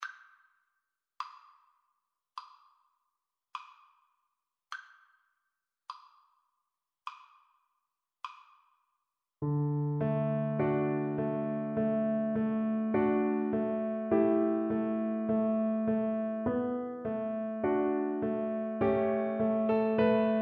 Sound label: DB, Double Bass